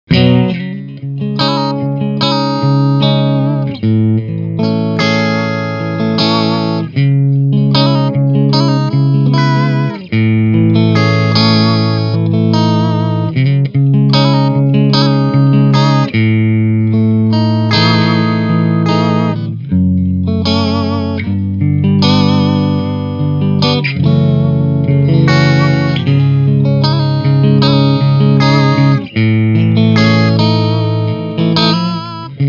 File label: Clean